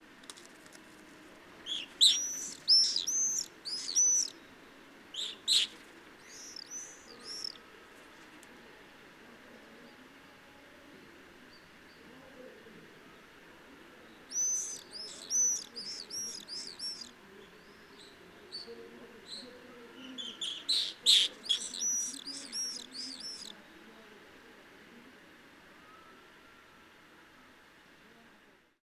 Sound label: Male and female duetting at dusk